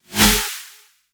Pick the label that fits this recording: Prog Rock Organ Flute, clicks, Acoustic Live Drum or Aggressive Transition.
Aggressive Transition